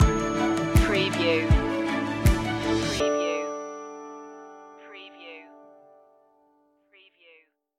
Music stinger; fall season; angst-ridden

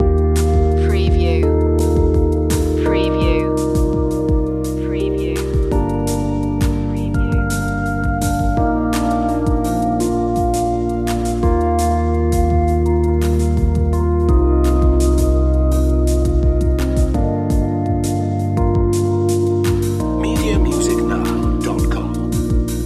growing strong loop; sentiment

